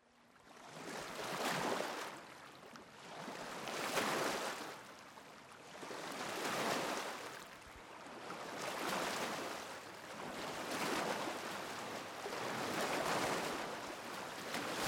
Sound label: waves